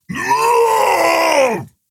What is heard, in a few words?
Falling; paladins; Makoa